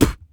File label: Human Beatbox, Beatbox Hits; Vocal Hits; BD; Loopmasters